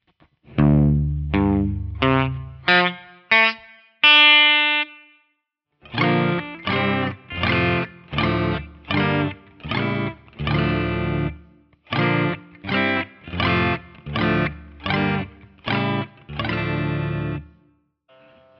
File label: d downtuned